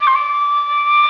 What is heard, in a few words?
flute